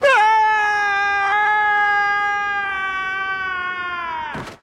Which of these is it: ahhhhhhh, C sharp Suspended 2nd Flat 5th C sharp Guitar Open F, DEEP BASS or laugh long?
ahhhhhhh